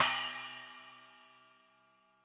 long tail reverb crash cymbal sound sample C sharp key